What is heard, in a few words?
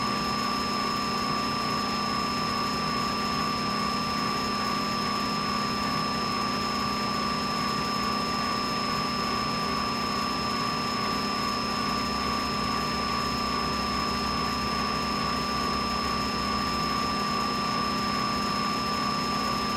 Boiler Room Ambience